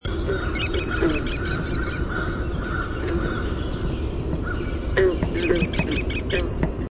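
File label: American Kestrel klee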